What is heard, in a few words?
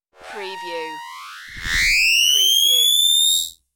alien power charge